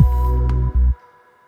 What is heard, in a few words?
Lil Bass; BB 2HTC Samples Vol 4; Solos